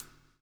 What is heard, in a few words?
BLUES TK, Backbeat; Spectrasonics - Backbeat